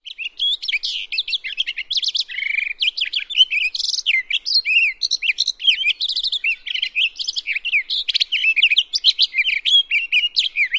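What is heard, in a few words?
continuous song, Townsend's Solitaire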